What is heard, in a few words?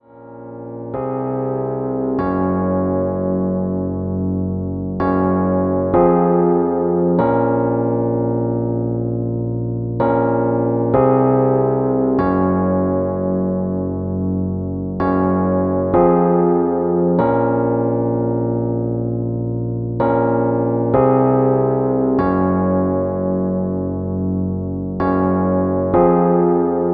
Rhodes chord, hip hop chords; rhodes chord 03